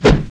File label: swing